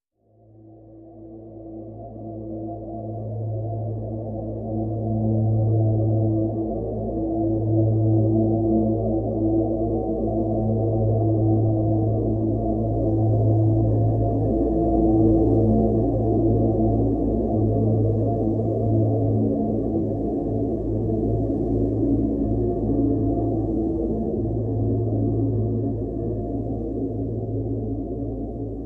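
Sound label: Drn A, drone; Drn River Crossing A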